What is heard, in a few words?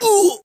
Scream